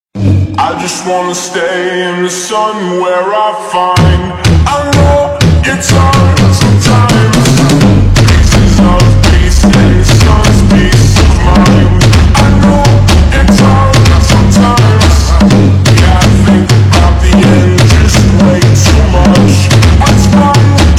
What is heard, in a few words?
Brazilian Funk Remix slowed reverb